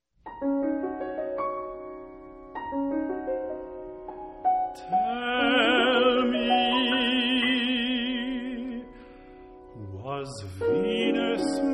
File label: Barcarole Sung By Accompanied